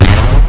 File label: Electro Gun